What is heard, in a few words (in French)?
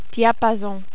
diapason